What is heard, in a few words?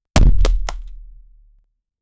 hit an object hardly, impact